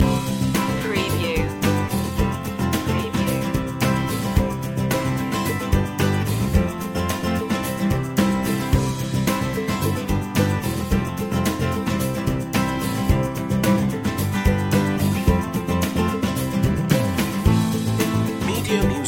simple happiness loop

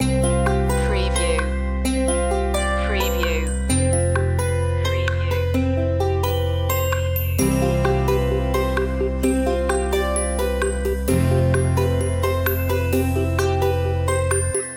the quirky traveller loop

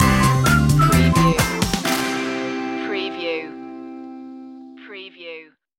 happy shopping stinger

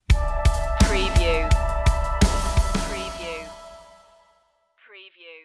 pressured stinger

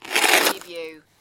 Snow and Ice, Hard snow or ice grate

